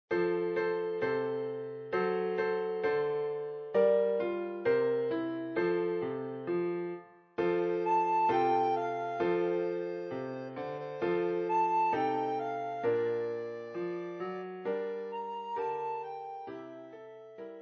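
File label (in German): Jingle Bells Blockflöte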